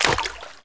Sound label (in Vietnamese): Am thanh tu nhien roi xuong nuoc